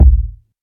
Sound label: round kick drum G key; kick drum samples